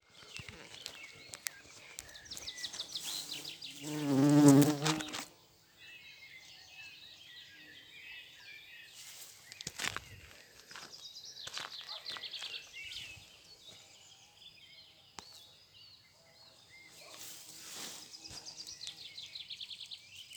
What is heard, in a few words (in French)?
bourdons tete jaune